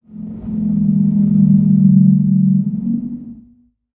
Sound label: Troom Whistle; moul-assets